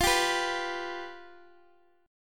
F Suspended 2nd Flat 5th, F, Guitar, Half Step Down